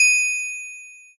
glocken

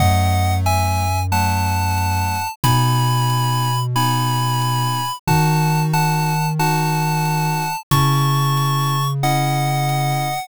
bgm mellow

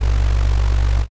vibrate

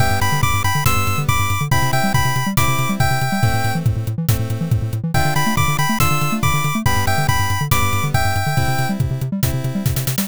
bgm

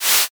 whiff

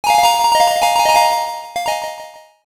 coin shower

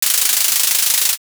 shuffle

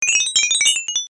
medal

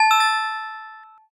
gamelan

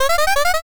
synth voice

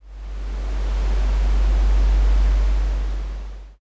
planet ambient

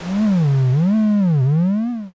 gate

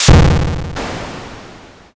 thunder magic

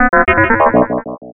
alien signal

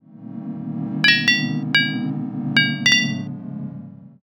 tavern

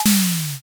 umbrella

